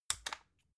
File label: magnetic plug; objekte